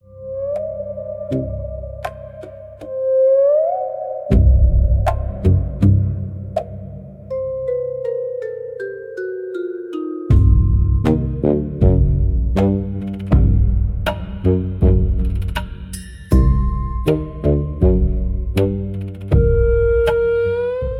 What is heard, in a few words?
swamp witchlights, horror